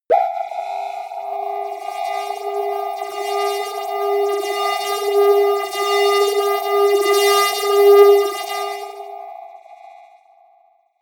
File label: creepy modulator long